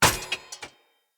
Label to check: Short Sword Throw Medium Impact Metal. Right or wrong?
Right